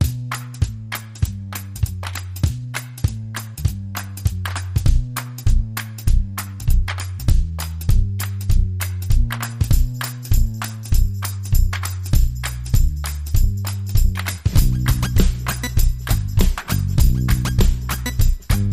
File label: Rhythm Underscore